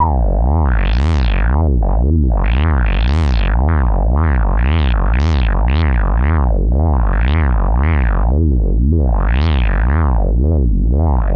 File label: LFO SYNTH; SE-1